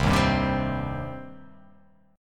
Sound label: C Minor, chord; strummed; JGuitar